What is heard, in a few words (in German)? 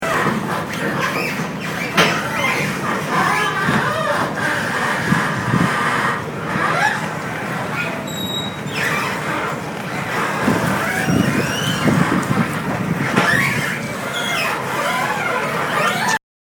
knirschen am ponton